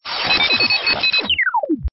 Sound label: Stinger; STINGERS